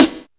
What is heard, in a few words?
studio snare drum sound e key